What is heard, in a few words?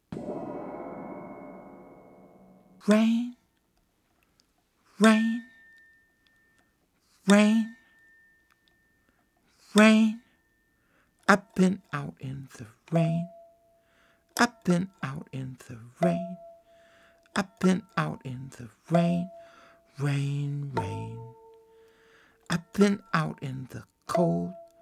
Rain Rain Rain